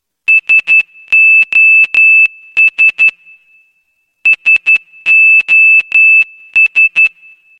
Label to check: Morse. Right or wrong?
Right